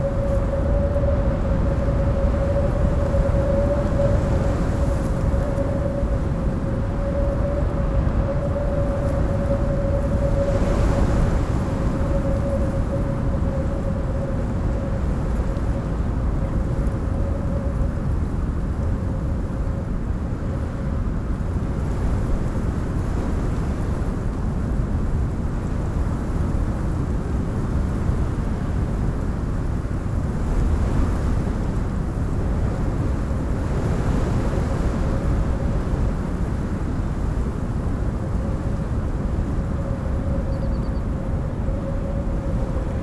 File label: Wind strong whistling; urban and suburban; boom library